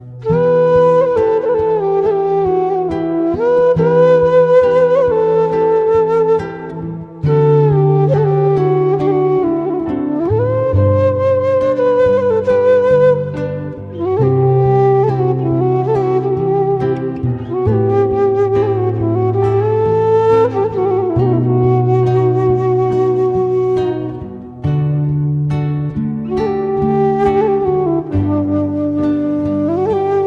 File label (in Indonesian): Sedih Instrumental Ringtone